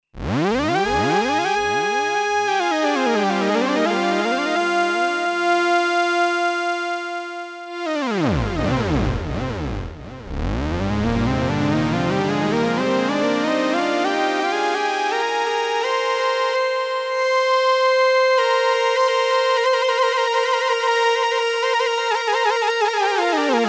moog theremini superfat saw mit pc aeolisch

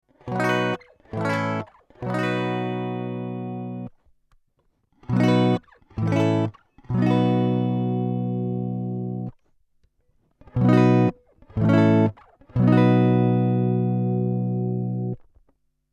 Golden Age Premier 73 DI Jazzmaster 55dB Gain

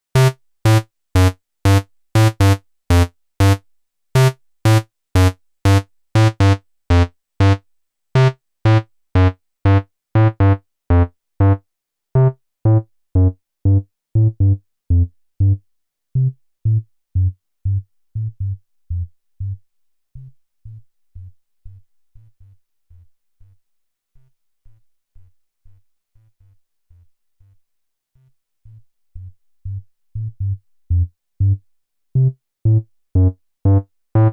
Zendelay; Ninja Tune

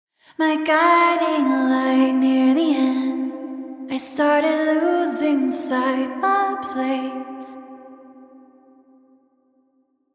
Vocals Dark Empty Recital Hall

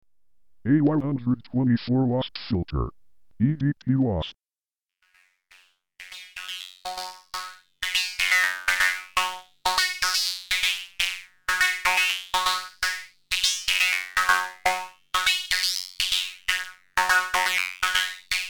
EDP Wasp HPF sequ dry

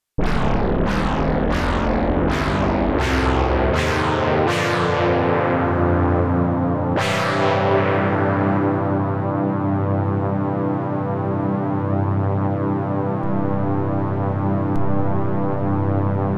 Osc Monsterlead UNISON NO HQ; REPRO-5

